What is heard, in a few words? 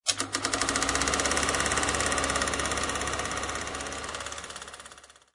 Projector Sound